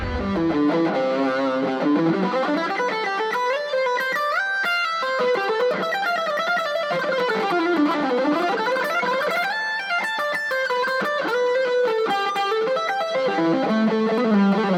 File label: guitar